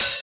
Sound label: Smooth Open Hat One Shot D# Key, Royality free open hat sound tuned to the D# note